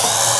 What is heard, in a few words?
Switch Cover; Playable Tutorial Level; TR3